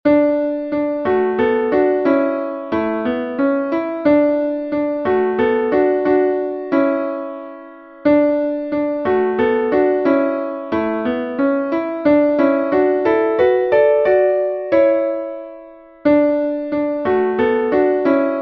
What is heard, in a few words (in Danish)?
Menuet